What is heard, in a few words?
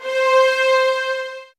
SOFT VIOLINS